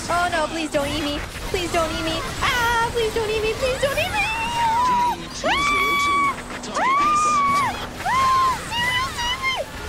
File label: Scream please don't eat me